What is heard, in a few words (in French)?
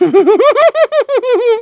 rire; étouffé